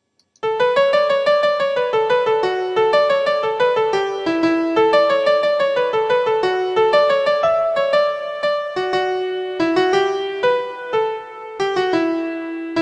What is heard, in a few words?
120 bpm, folk tunes; Ride Cock Horse